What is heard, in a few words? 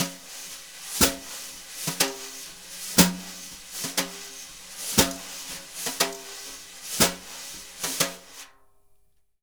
BRUSH, Jazzistic; Univers Sons